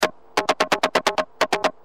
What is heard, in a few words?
Dist, Industrial, Drums Perc